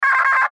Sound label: Radio Morse Code; Weak Signal Letter C; Warfare; Blastwave